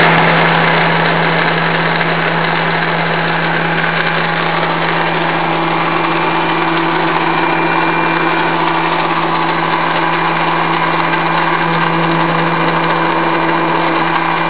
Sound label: cavitation, pump